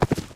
Horse Footsteps; BTD6; Legends

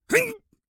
Damage; Striker Jones

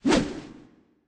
Logo Swish; Intro

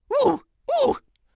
ooh

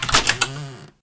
UI Chest Open

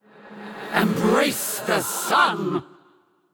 Activated Dark Ball Of Light

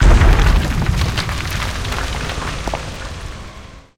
Dreadbloon Rush

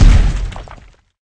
Removable Impact; BTD6

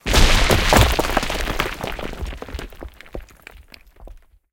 UI Prop Encrypted Collapse

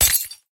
Pop Glass; BTD6

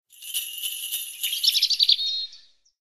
Prop Cardinal

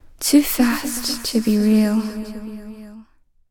Ddt Warning Dreamstate; Psi

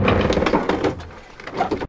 UI Halloween Chest

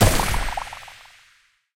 Pet Possum Transform Large

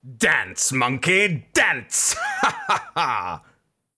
minigun; churchill taunt

